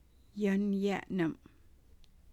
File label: laughing